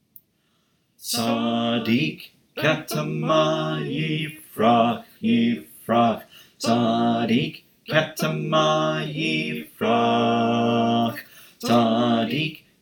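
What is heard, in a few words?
Bass, choir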